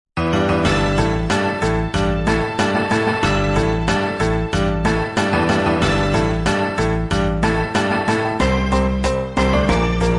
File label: Nokia Bold Android Ringtone; ringtones